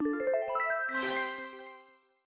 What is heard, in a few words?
level up